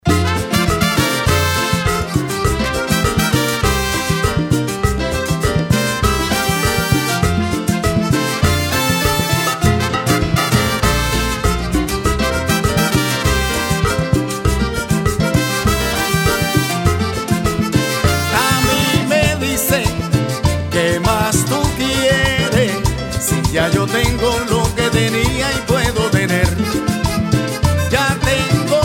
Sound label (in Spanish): Salsa